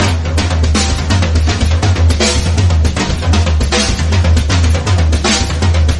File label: drums; horde